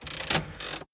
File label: slots